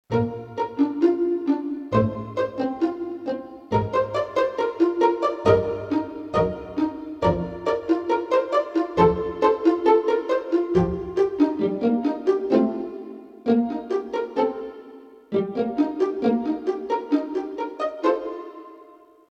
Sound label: strings Pizz